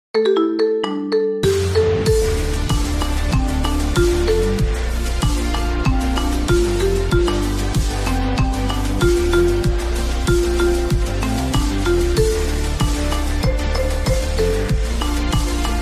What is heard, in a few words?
ticking away marimba; valorant